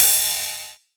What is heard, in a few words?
Ride, Cymbals